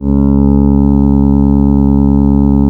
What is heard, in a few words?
C#, Synclav FM, WIND; Big Fish Audio